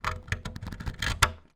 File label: household lock bolt small open or close slow close up